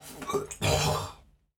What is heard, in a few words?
Man Choke Cough